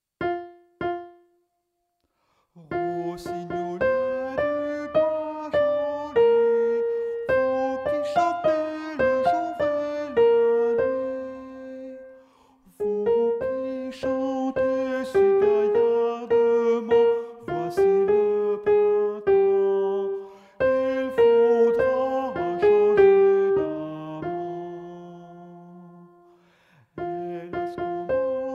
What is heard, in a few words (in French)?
soprane; ROSSIGNOLET (soprano chanté)